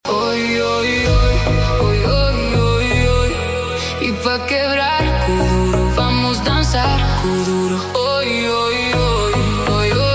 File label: summer nights deep house vibes